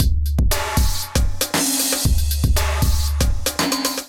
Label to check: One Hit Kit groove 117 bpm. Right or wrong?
Right